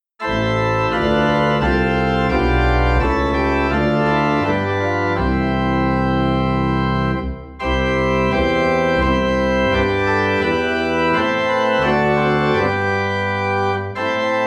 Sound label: Organ in C; A Mighty Fortress Is Our God